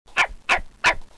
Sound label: dog yip